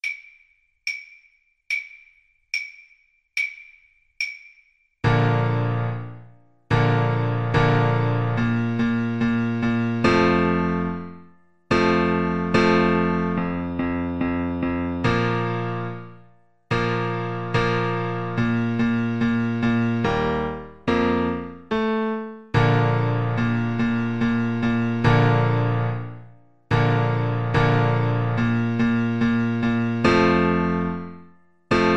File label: fanfare, Tenor Saxophone